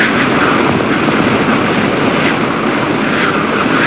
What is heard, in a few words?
wind loop; Ferazel's Wand Demo